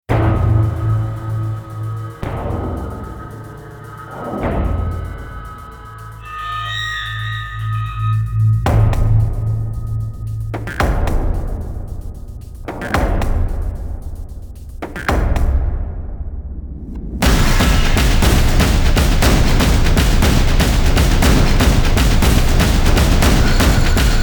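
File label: Hell Fire Drums, trailer music; трейлерная музыка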